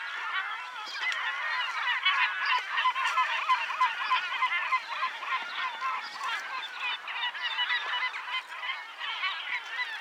Goéland leucophée (Larus michahellis) - Cris et chants

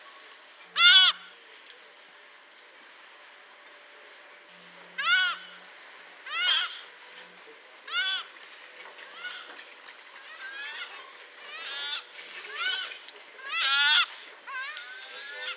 Goéland d'Audouin (Ichthyaetus audouinii) - Cris

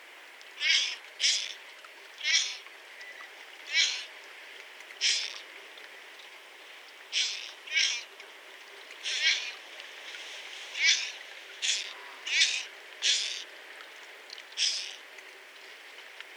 Goéland d'Audouin (Ichthyaetus audouinii) - Cris râpeux profonds

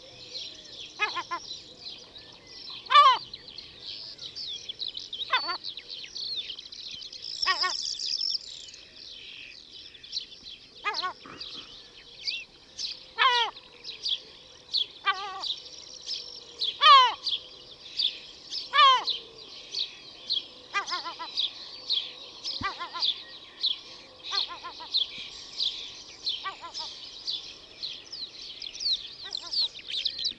Goéland leucophée (Larus michahellis) - Divers cris d'alarme

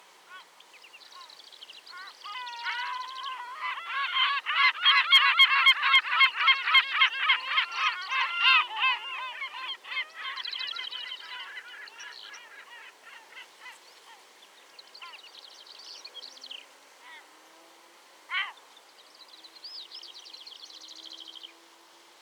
Goéland leucophée (Larus michahellis) - Chant

